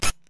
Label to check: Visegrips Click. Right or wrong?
Right